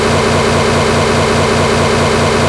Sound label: MOTOR RPM 4X4; SIMUZENSOUND